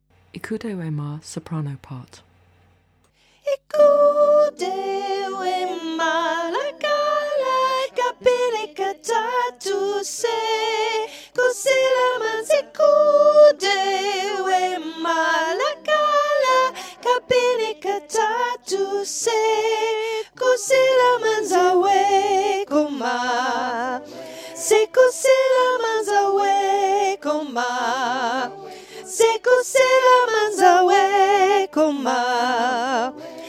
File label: soprano